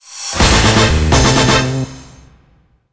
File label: Raid UI Boss Mon Appear